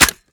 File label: reload empty magin, sh; reloads; iw8; romeo870; gmod